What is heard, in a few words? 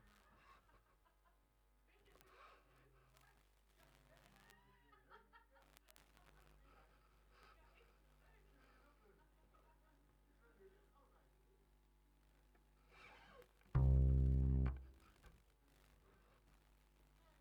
Bass DI